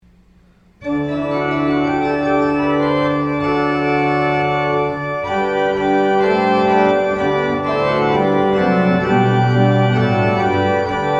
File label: Ode to Joy Instrumental